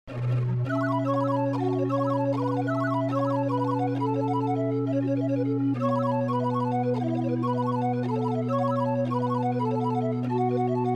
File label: Cheerful panpipes